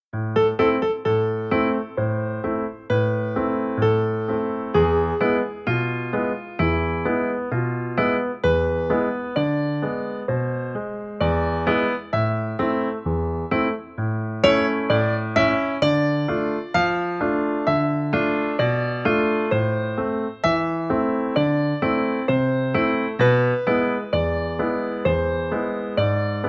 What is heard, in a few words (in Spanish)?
Trémolo